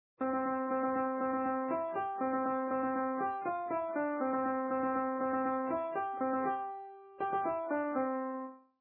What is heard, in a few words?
gallop